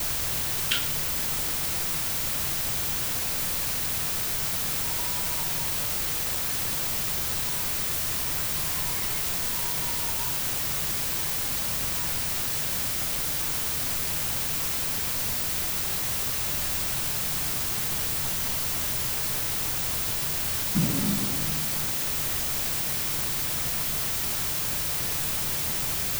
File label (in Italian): Toc fischio colpo